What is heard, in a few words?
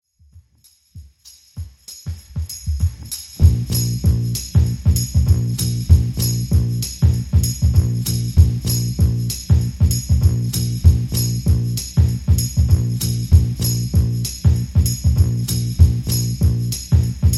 185 Bpm; Dj Intro Outro